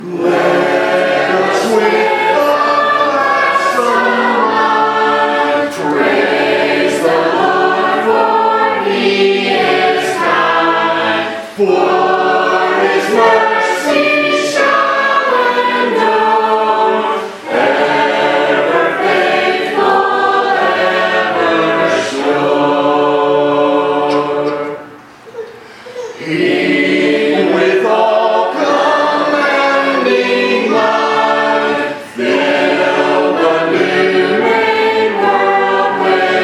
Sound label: singing class, hymns; let us with a gladsome mind